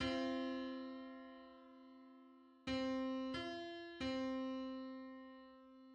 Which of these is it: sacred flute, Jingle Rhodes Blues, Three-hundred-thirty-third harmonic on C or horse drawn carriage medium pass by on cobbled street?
Three-hundred-thirty-third harmonic on C